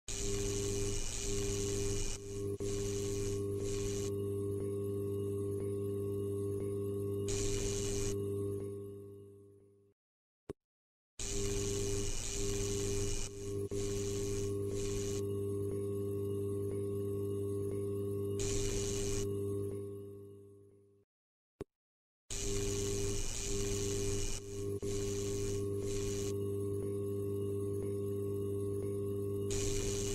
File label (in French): Bruitage Neon